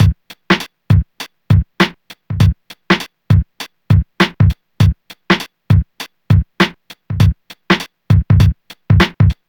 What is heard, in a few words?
100 bpm breakbeat g key